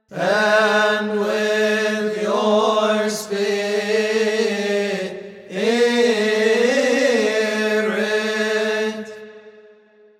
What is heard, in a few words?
And with Your Spirit